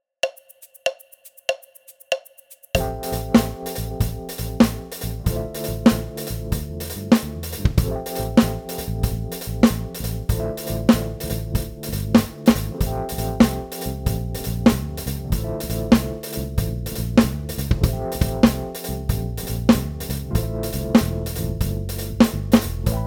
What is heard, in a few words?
InBetween Groove